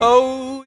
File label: "oh", SUNG; Voice